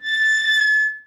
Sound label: bridge gate open left; DeadFright